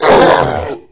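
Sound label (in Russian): ворчание животного резкое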